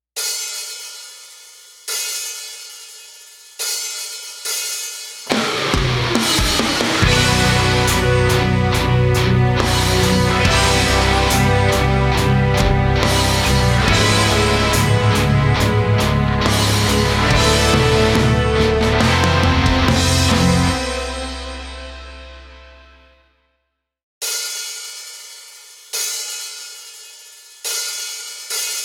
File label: karaoke